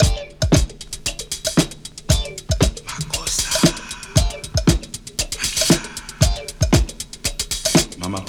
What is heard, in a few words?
breaks